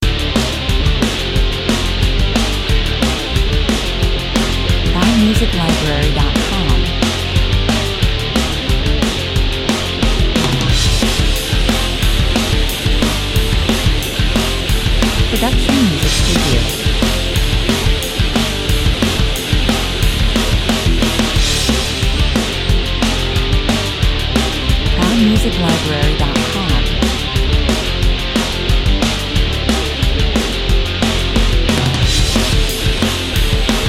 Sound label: Rapid tracking shots of the inside of a metal guitar, 90 bpm